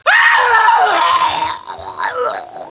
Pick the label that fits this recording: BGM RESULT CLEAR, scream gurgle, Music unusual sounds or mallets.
scream gurgle